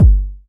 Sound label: warm sounding steel kick drum g key